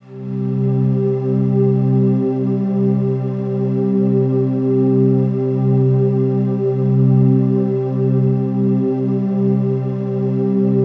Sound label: beauty, ethereal atmosphere